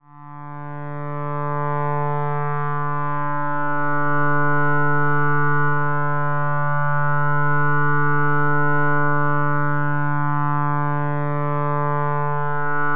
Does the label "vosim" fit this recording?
Yes